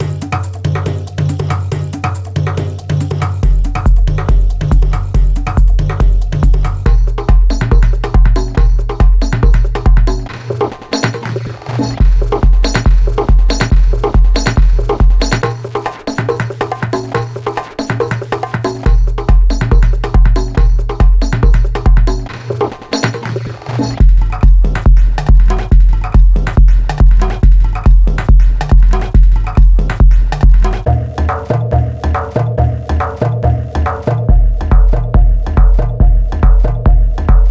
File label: Glitched Techno Tribal House; Bluezone